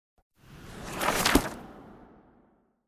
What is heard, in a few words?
Book Close Back; the unfinished swan